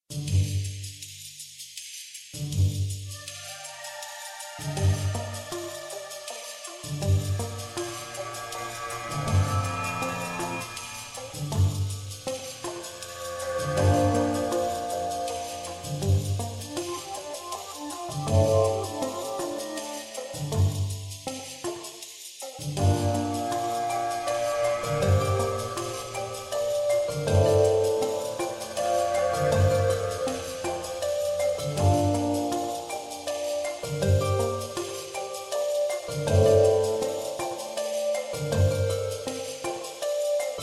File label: wilderness calm ice, music